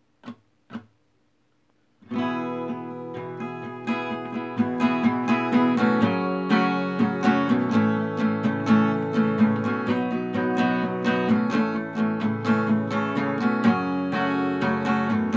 music acoustic; viet sub and pho

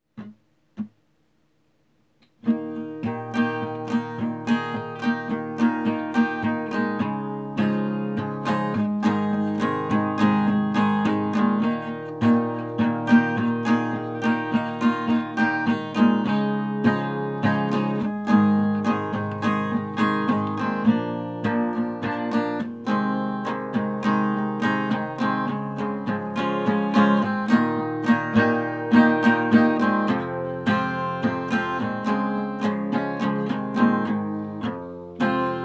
music acoustic; champion contracting services

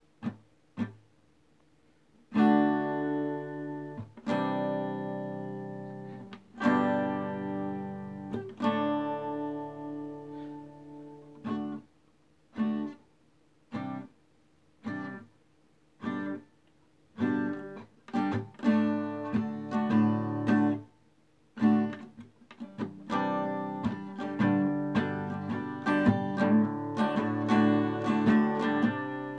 music acoustic; caribbean hangout

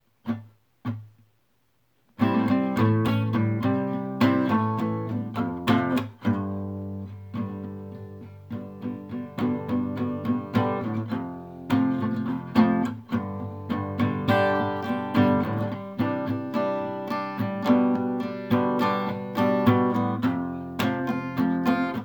music acoustic; big daddys eatery

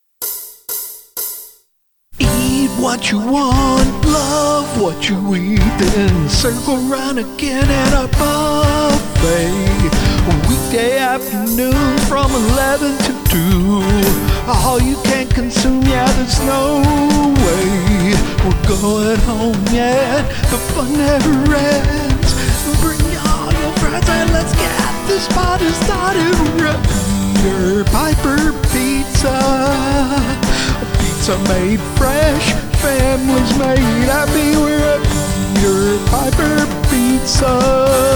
music male; peter piper pizza

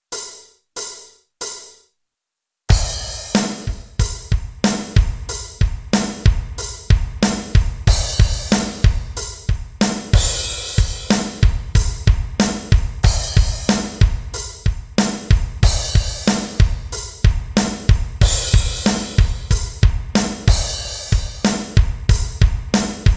music drums; haaks vineyard and winery

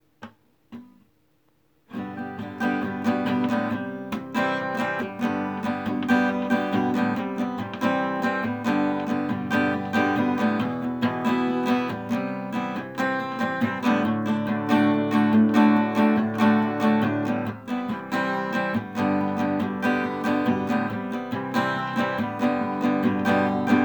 acoustic; cali kitchen

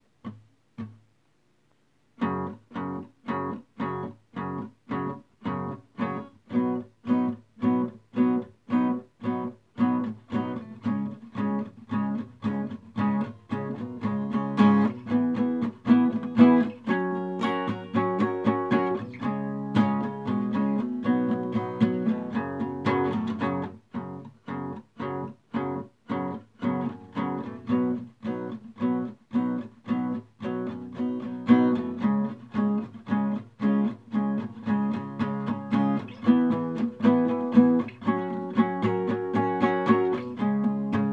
acoustic; houston auto emporium

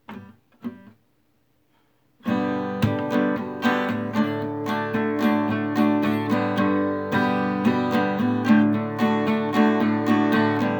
music acoustic; pizza bella

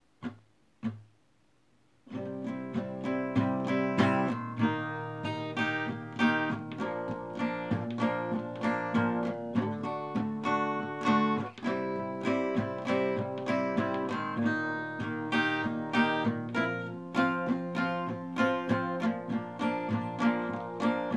music acoustic; buds barbecue

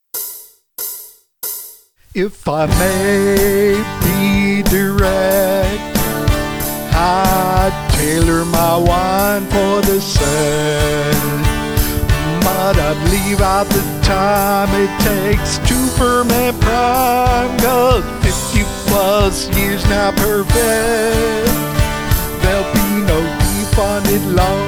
male; haaks vineyard and winery

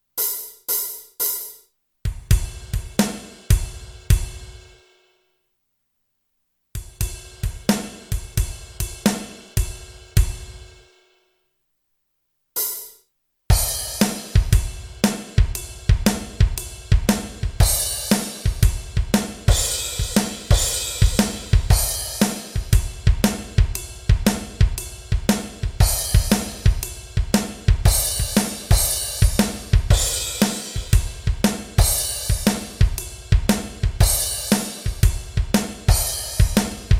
music drums; xwireless

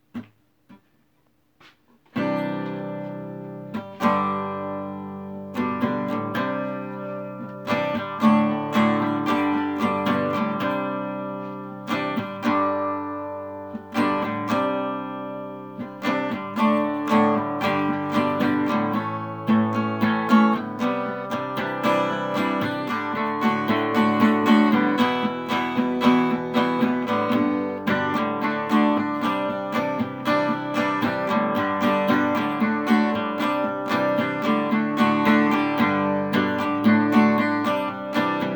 music acoustic; peter piper pizza

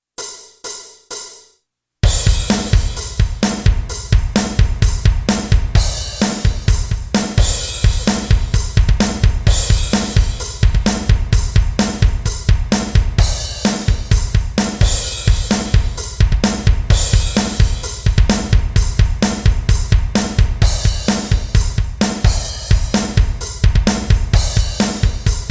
music drums; startup optical

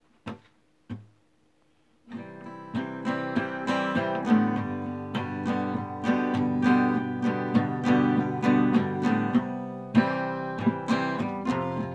music acoustic; cuban cafe

